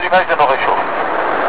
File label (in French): allumage rechauffe